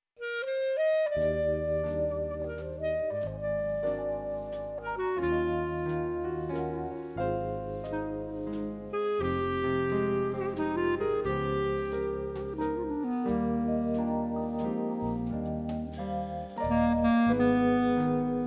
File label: Swing; Live Band; Hotlanta Dixieland Jazz